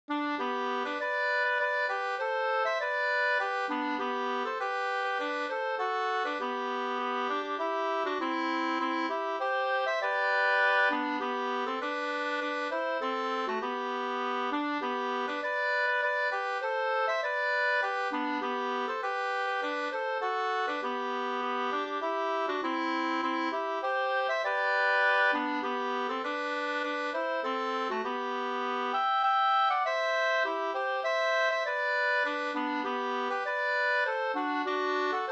vismelodi; En sommartrall av Johna Abrahamsson

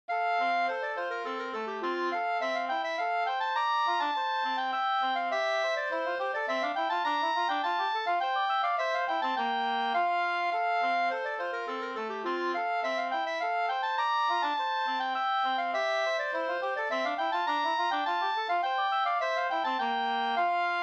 slängpolska ringpolska

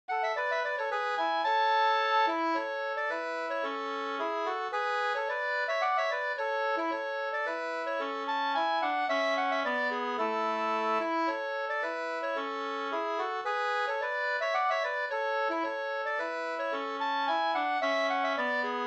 kadrilj A dur; fr Oxie harad SvL 2st

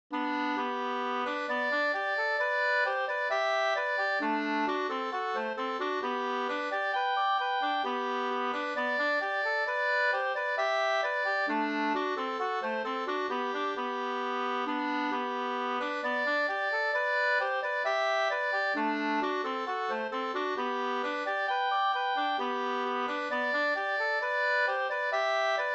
schottis lybeckare

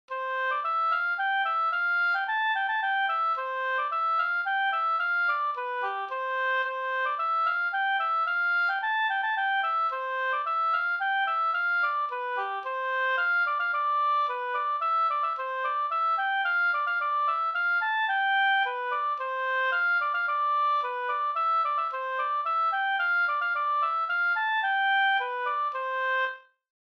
polska

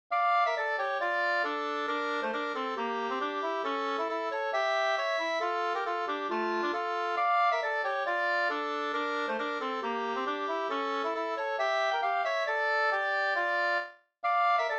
schottis

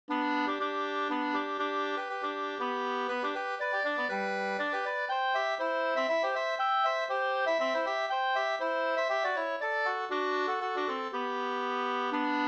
polska Gdur

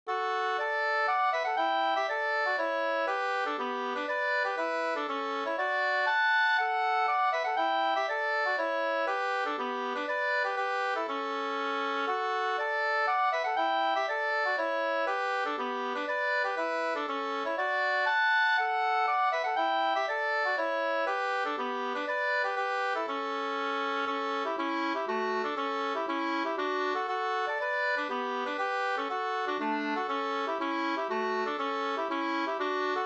polska Bodapolska

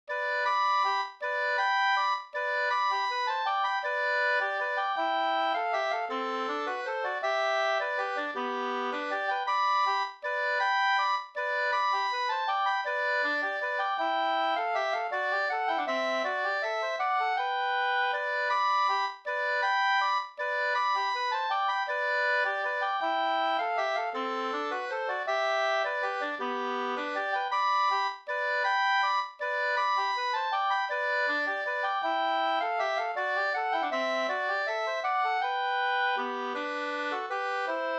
vals G dur; Dalby

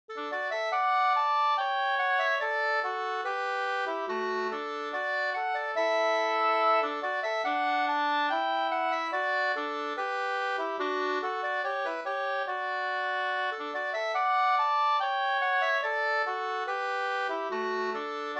brudmarsch; Kyrkstafetten av Jon Aakre; Sandviken

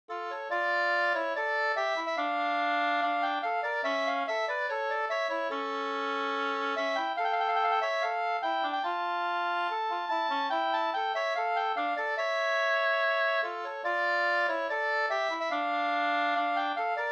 skanklat

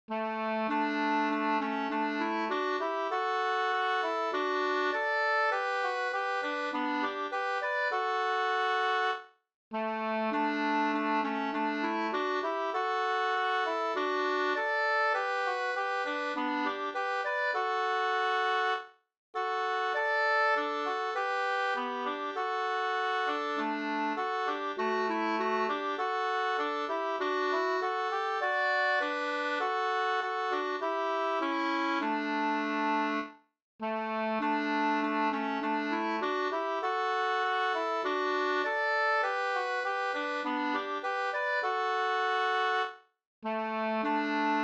vismelodi

